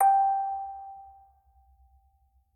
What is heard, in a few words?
orgel